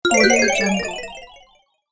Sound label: magic fantasy health boost